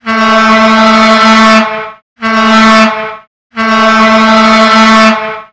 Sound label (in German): D Horn 2 kommen Zp11